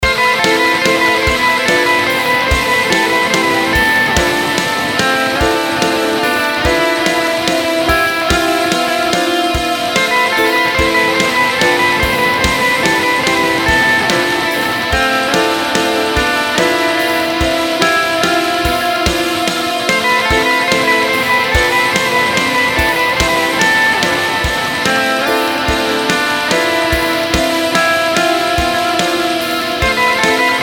ambient

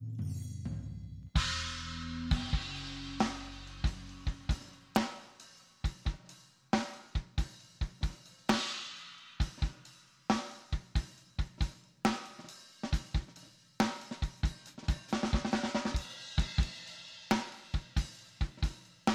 drums with oh comp; real drums; snare in the overhead

